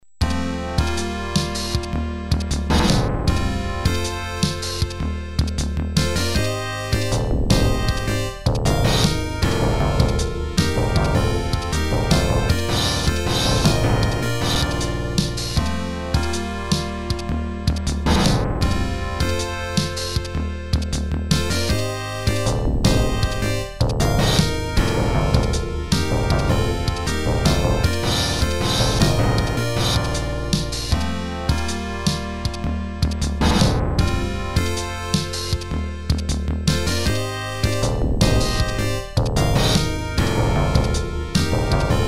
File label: Goal (OPM); Super Hang-On; X68000